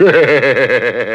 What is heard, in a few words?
RAVE; Rubber Chicken Software Sample Bank; RAVE CITY